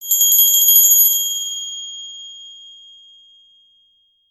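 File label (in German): klingelton weihnachtsglöckchen glöckchen klingeln sound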